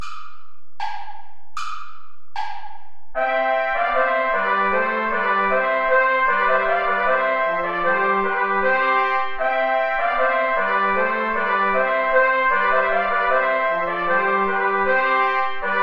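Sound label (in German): schmückt den saal mit grünen zweigen play along without trumpet; traditional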